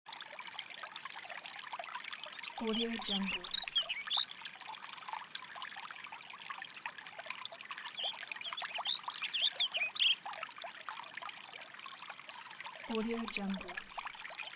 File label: birds stream nature